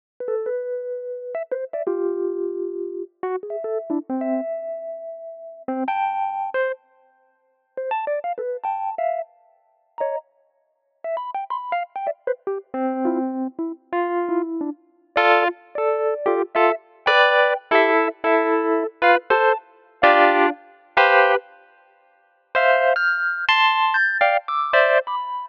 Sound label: Lounge Lizard